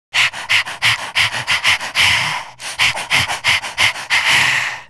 Breath, Vocal Toolbox, Sound Bites; Sonic Foundry (Sony Creative Software)

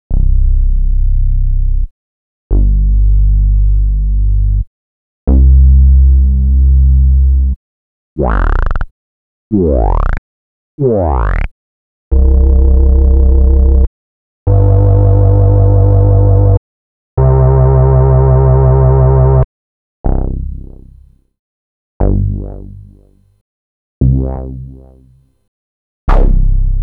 breaks, Jungle; Zero-G Jungle Frenzy 2

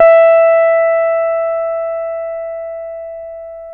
E4, LAP STEEL

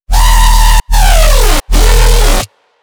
BASS SHOT Scream

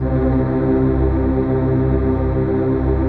RAVE PAD; Best Service; XX Large Pads; Sample CDs

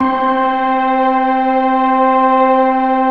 PAD, Drum & Bass 170 BPM; Ueberschall; Drum & Bass Flash Trackz

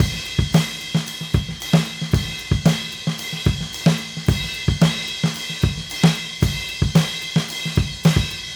Raw Funk Drums, 70s Analog Funk; Sonic Foundry (Sony Creative Software); What it Is! 70s Analog Funk

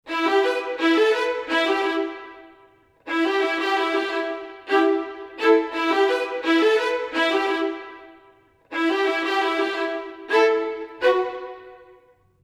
85 violins, Orchestral arrangements, 85 E min

